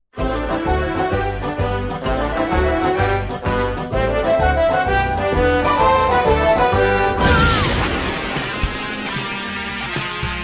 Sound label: stage clear